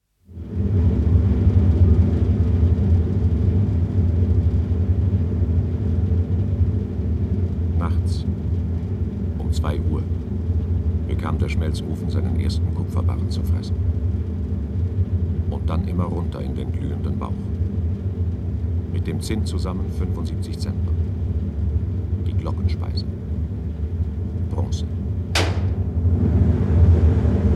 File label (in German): glocken in europa; Peter Leonhard Braun (1973): «Glocken in Europa»; Sender Freies Berlin, 1973